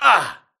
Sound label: grunt hits; Titanfall 2